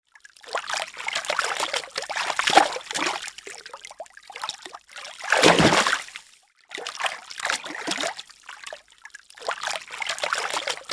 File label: Swimming lightly water movement indoor pool